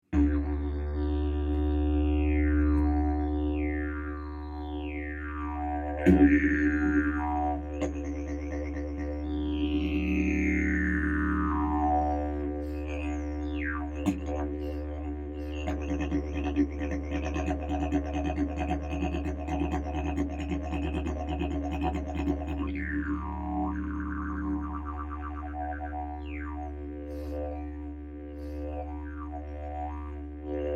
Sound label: agave d, didges